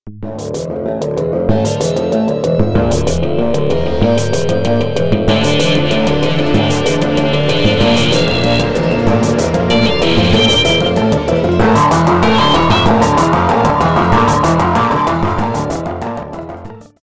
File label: Suspense